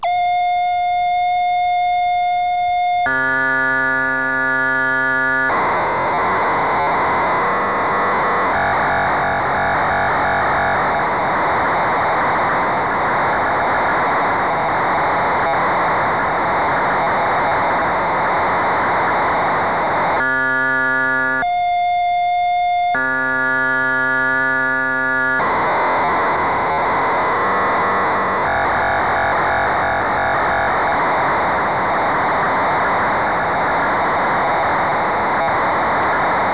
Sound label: Korg Poly-800; Poly-800.EX-800